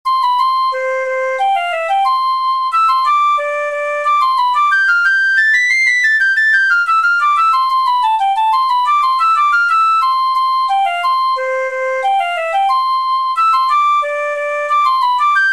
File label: sailors hornpipe ringtone android; android phones